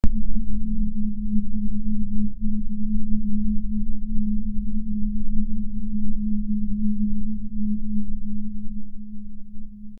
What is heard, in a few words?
soft glowing orb hum gent; core